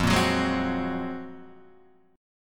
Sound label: F sharp Major Sharp 11th F